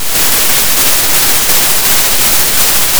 Sound of a thunderstorm

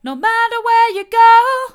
mid, vocal

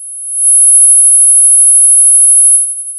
Sound of a tea kettle whistling

